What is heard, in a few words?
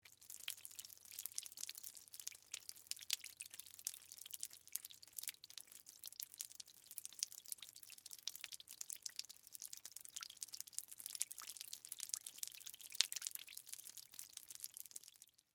Water Drips; Water (Sound Effects:Nature); BlastWave